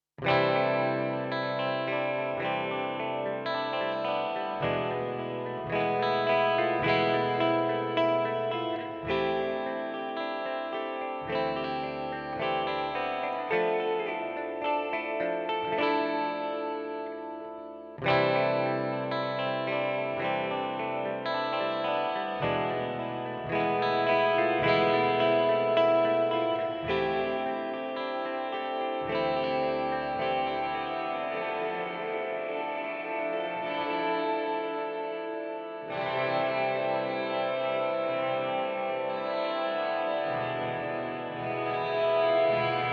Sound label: Hall Reverb Meris Mercury 7